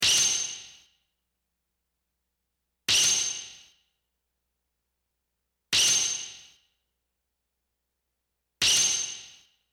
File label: japan sword